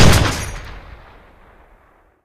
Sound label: wind down, weapons; tomislav; tf